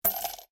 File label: coin drop; the 39 steps